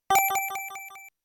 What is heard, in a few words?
Press Start; Alien Soldier